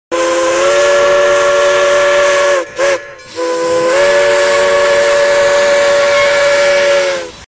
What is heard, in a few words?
horn